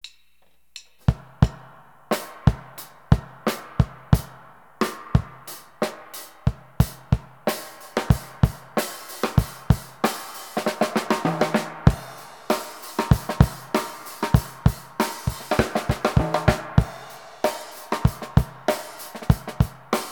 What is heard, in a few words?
Drum Test